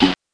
vocal blip; percussive; REMIXABLE COLLECTIONS; Ze Franks Pain Pack; pain pack percussive and bips and clicks

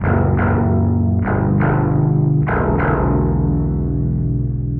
deep ominous bassline; SYNTH AND ELECTRONIC LOOPS

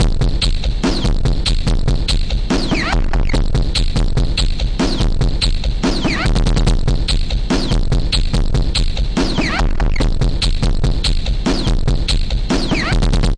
72 bpm distort o matic turnarounds

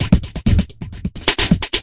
130 bpm, flattened echo kick clapper

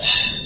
subway sound; Subway Sound Construction Kit

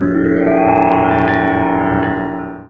resonant dream sound; Weird and Interesting Hits

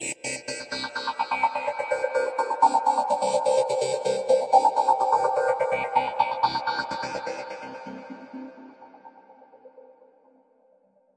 126 space banjo; SYNTH AND ELECTRONIC LOOPS

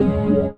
bendy thick guitar chord

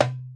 arabic perc, Arabic Percussion Kit

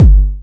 Syn Dr, Overloaded Synthetic Drums; style kits

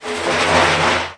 arabic perc, Arabic Percussion Kit

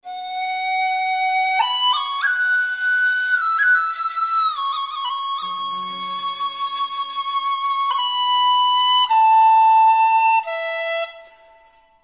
chinese flute melody; MELODIC SAMPLES

